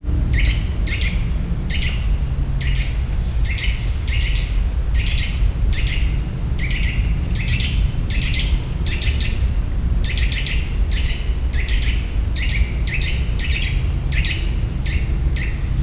másik hangja, csuszka, Visegrádi-hegység; termeszetben

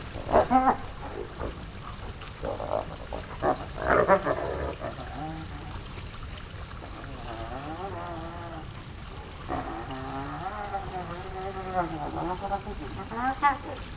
este játszanak egymással, foltos hiéna; jaszberenyi zoo 2016

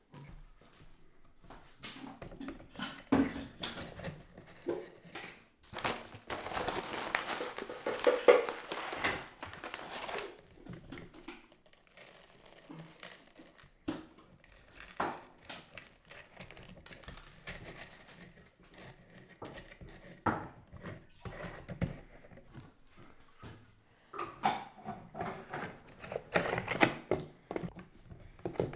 kávészemek betöltése, fiók helyére való betolása, fáradtságos darálás, kávédaráló kézi; Perkupa

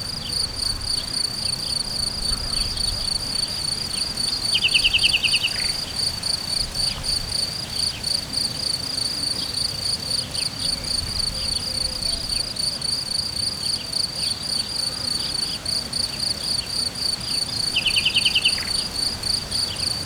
fülnek kellemes balra csicsergő madár hortobágy, tücsök; allathangok; termeszetben

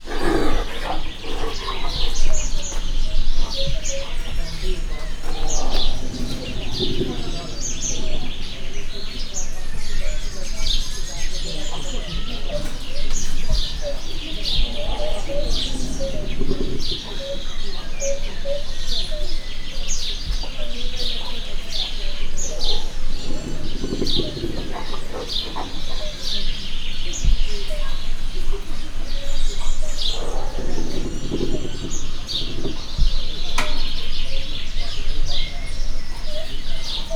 halkan morgó hiéna; jaszberenyi zoo 2015

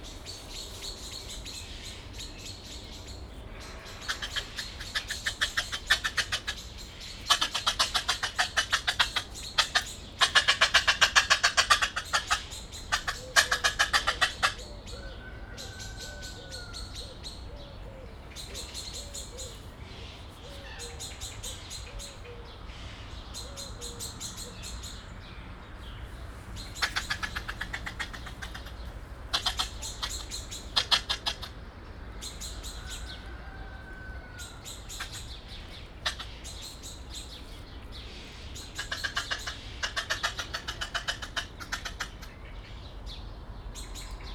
aktívabbá válik, nílusi lúd; kecskemeti zoo 2016

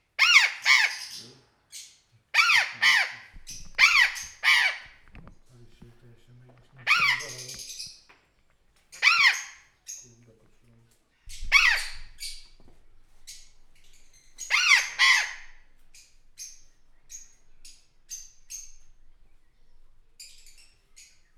nagyobb vehemenciával, sziklapapagáj; jaszberenyi zoo 2016

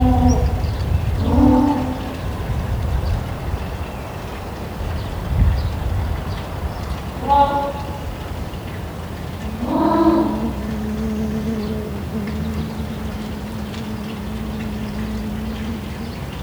szél, rovar, pápaszemes pingvin; marantz puska; debreceni zoo 2012

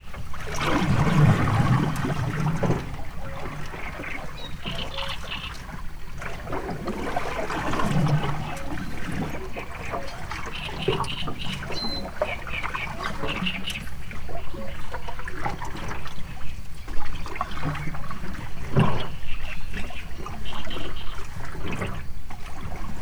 cserregő nádiposzáta kakukk evezés; termeszetben

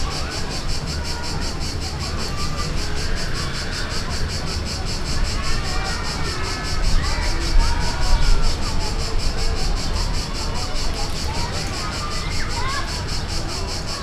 kaboca es furdozok, beach; tengerparti hangulat thassos 2016; makryammos

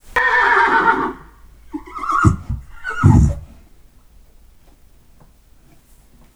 nyerítenek, ló; parasztudvar

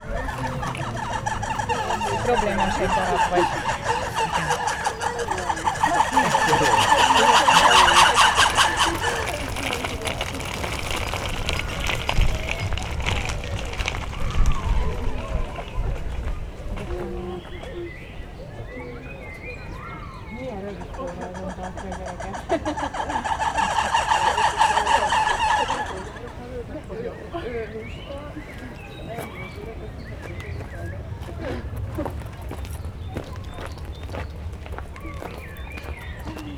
napközben, vörös vári; akg; mono; allathangok; veszpremizoo 2013

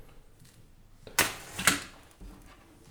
lángra lobbantás, gyufa; Perkupa

